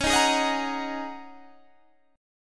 Ab 9th Suspended 4th Db

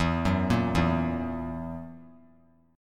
E Suspended 2nd Flat 5th E down; arpeggiated; JGuitar

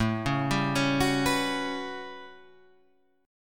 A 13th, Guitar Standard, chord; arpeggiated

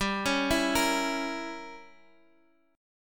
Bb Diminished 7th G

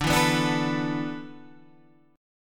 D 6th Suspended 2nd D, chord

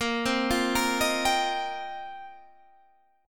F 9th Suspended 4th Bb, chord

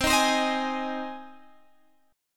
C Minor Sharp 5th C Guitar Open G

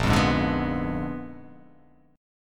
A sharp 7th Flat 9th, guitar chord; 6,8,6,7,8,6,x,x; down

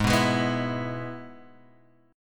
Ab Minor Sharp 5th, Guitar, Open G

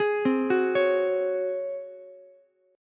Ab 11th down Ukulele C6; arpeggiated; JGuitar

